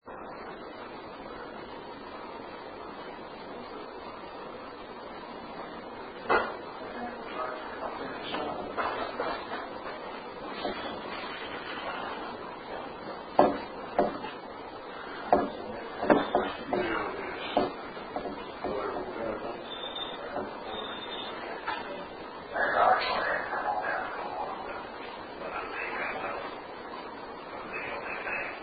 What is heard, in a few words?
Home Sewing Room door; unexplained door mo